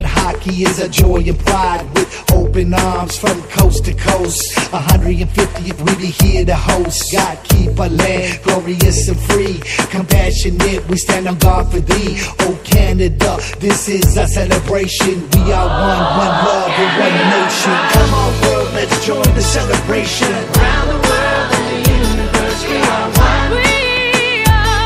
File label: canadian ringtone